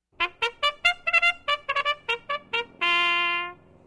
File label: Gong